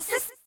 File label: rah Rah Sis Boom Ba Boom girls; Cheer Readers